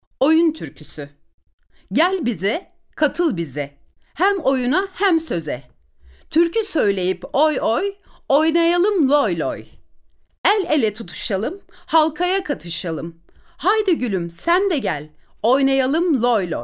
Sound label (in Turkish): Oyun Türküsü